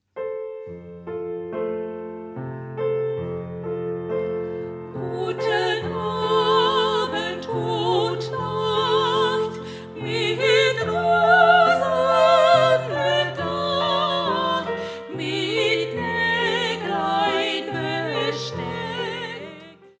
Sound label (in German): Wiegenlied